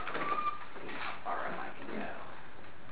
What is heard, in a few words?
stairs setting up camera; wornall house